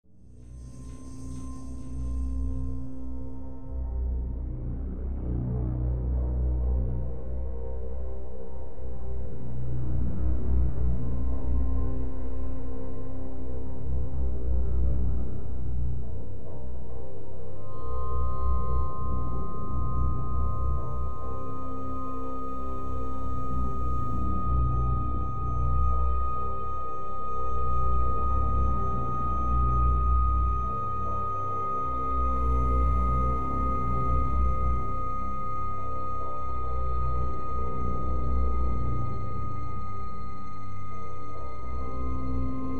Eerie

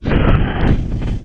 Big Gate Close

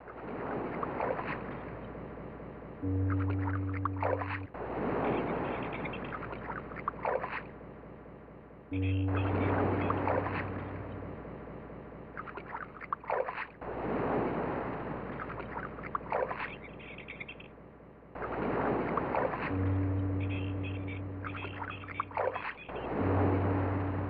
Ambience of the Ocean; True Lies; SNES

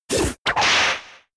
Vine Whip

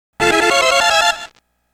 PAUSE (Pause Sound)

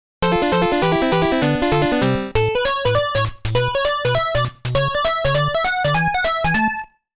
Miss ~ Game Over; Game Sound Museum Namcot; Mappy; Mappy Land; Mappy Kids

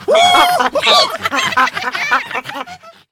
Level Failed Monkeys; angry birds rio

